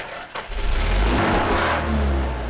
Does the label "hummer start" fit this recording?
Yes